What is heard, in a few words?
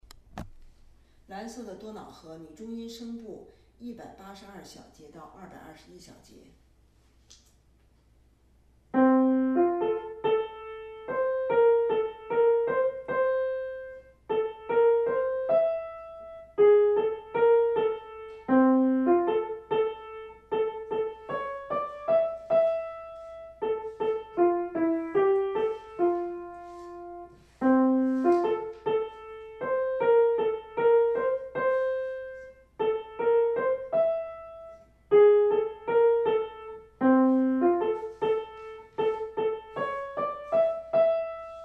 bluedanube alto